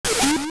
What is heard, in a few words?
Dive; Link; Zelda; MC